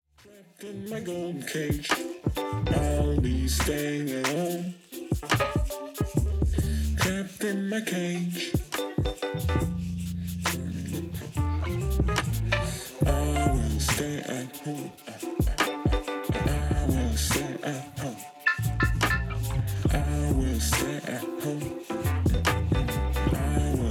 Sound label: RnB Jam